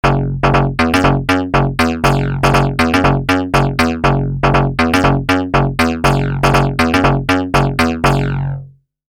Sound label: DSS VEL TO FILTER CUTOFF